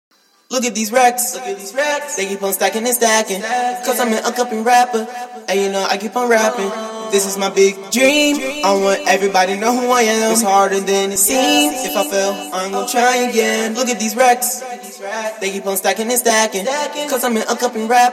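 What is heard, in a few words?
hip hop 145 Em, acapella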